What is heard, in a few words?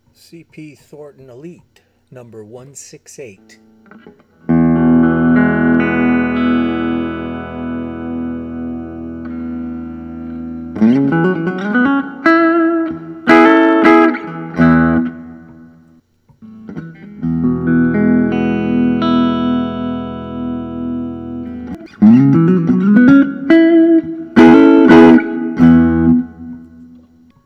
cp thornton elite cp thornton elite